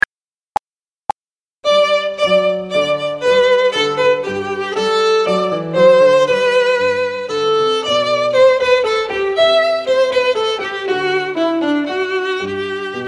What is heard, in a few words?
Minuet No 1; Bach